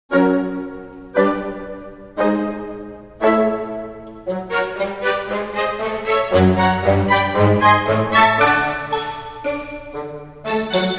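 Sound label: Dramedy Sting, No Percussion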